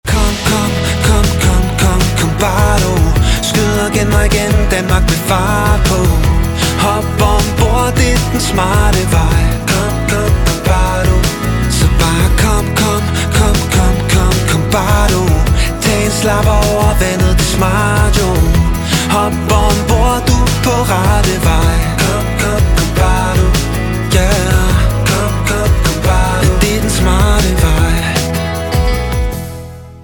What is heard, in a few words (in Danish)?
ringetone; molslinjen